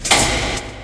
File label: side slide